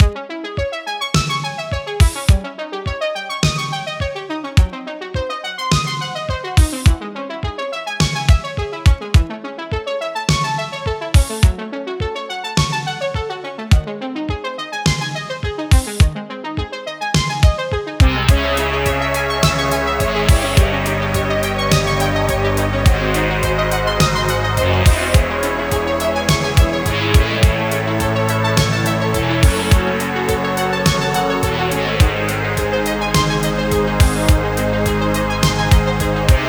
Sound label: Waveshaper, Horn Defense 105bpm Loop